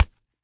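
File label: noiseless dry click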